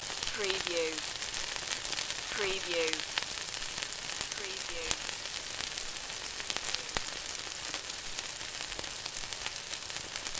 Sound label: Frying Loop